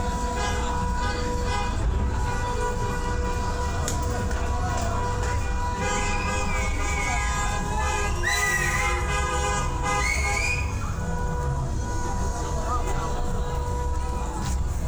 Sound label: foley